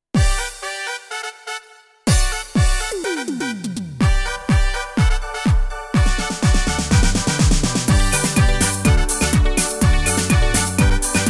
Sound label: Punta